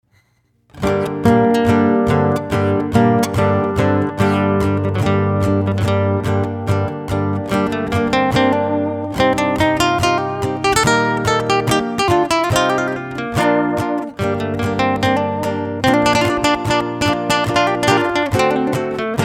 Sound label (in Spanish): intro guitarra